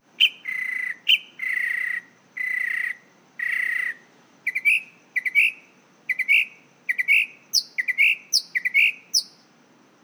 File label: mockingbird